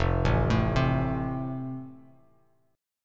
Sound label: F Major, Bass Standard; arpeggiated